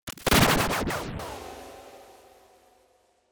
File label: Whoosh Transition Doppler; Glitchedtones; Whooshes & Transitions